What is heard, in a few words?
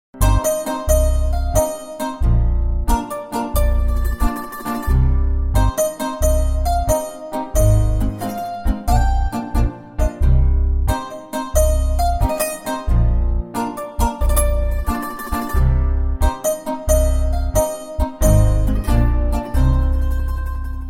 bgm ringtone; andala rakshasi; telugu